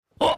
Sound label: panda Poke; shangrila